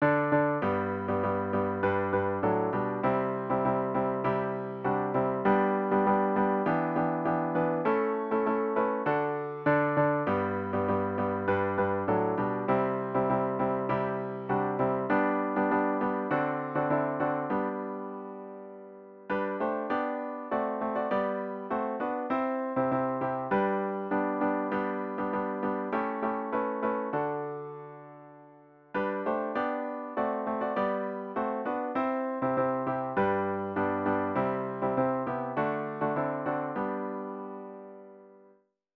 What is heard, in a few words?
midi